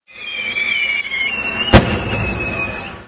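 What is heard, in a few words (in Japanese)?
hanabi, fireworks; 着信音やアラーム（目覚まし）音に使える、着モーションとして; FOMA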